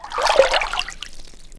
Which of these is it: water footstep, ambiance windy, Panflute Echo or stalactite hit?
water footstep